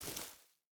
footstep rubber boots grass walk; Rust

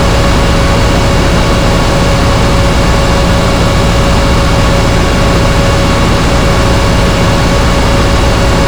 AAV7 Engine Max; DCS

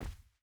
footstep barefoot cloth jump land; Rust

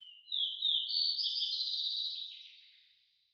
ambient winter birds; War Thunder

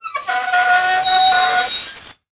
metal squeak; World of Tanks Blitz

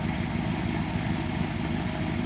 Technic Engine; DCS

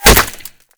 impact masonry; Modern Warfare

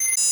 autoturret target lost; Rust

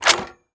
12mm nsvt mech start, guns tanks

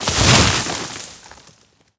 treefall down; World of Tanks Blitz

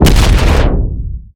damage hits explosions, Warships

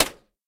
bullet hit outside; War Thunder

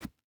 footstep hide sand jump start; Rust

